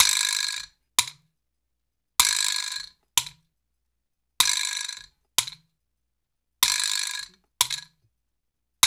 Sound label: Vibrottles